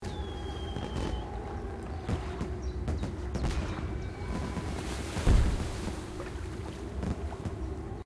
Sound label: ambi main